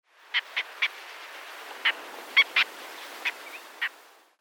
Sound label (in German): Kampflaeufer Philomachus pugnax; Vogelstimmen